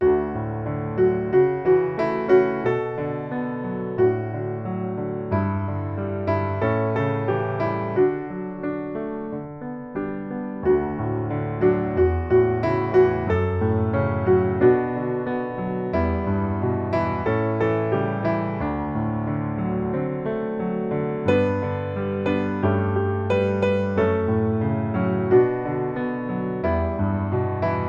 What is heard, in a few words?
piano